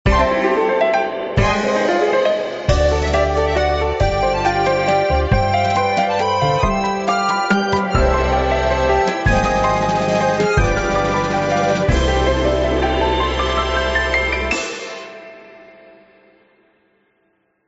Level Completed!; Cookie Jam

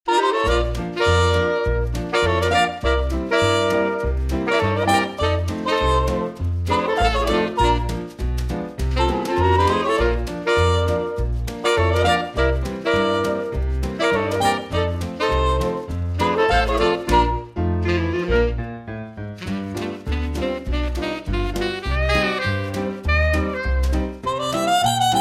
Instrumental; Nick Jr Radio

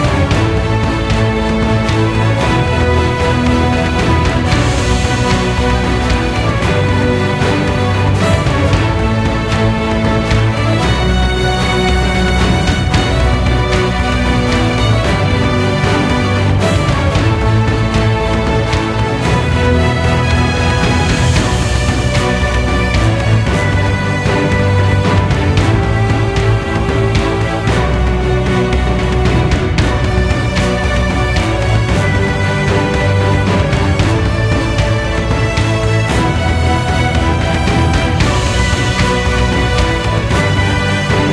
Orders Battle Loop; In Verbis Virtus

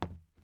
plastic step

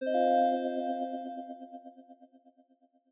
Clear Em7 Low

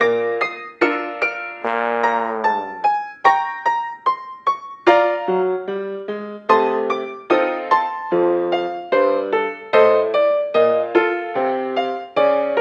Lose; Sundae Fundae; Cooking Dash

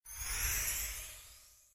Level completed Goals; Secrets of Magic: The Book of Spells

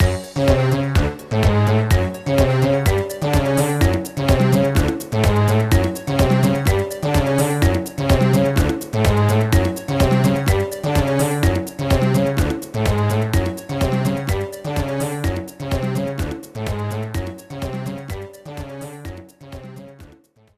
battle Intro; Yo-kai Watch 3; 3DS

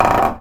Window Shutter; Levels Hub Diner 02 Light Buford; Fx Window Shutter Open

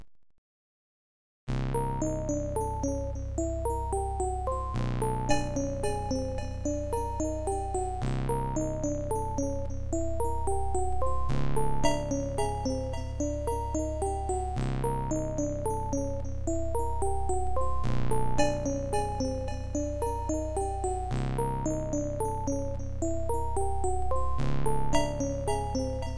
Death's Footsteps; Kurayami; PC-98

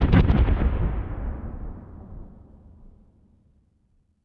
Factory Explosion; gamerip; Plants vs. Zombies Garden Warfare 2; PS4, Windows, Xbox One

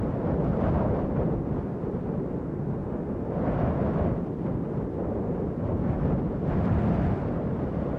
Gust; Granblue Fantasy; android

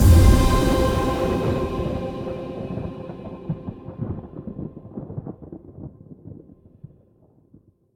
Combo C

